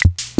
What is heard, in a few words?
Suzuki Omnichord OM-84